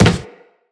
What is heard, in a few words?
Operator Walk Wood, Footsteps